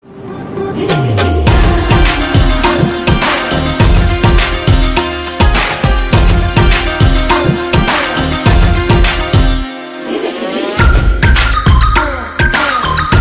instrumental ringtone

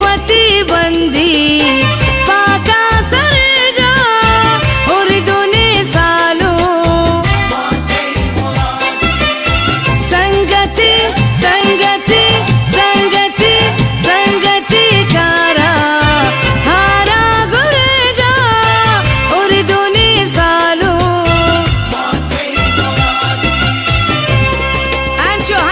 wedding song, ringtone

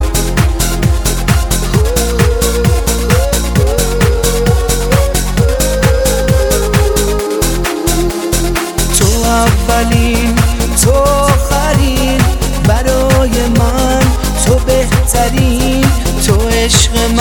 indian ringtone

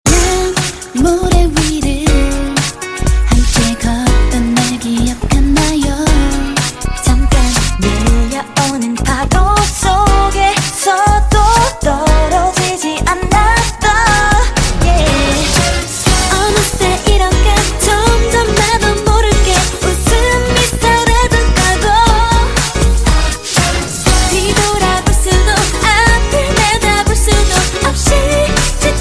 english

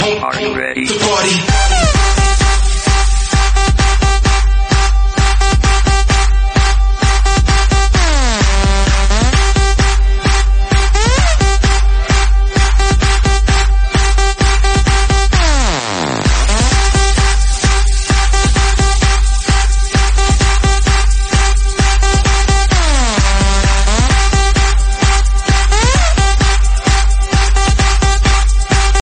dirty dutch, ringtone

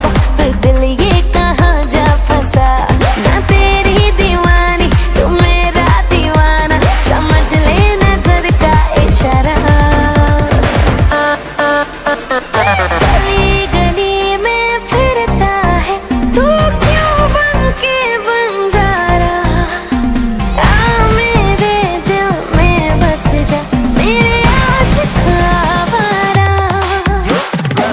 latest bollywood song

